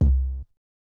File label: Not Nice Kicks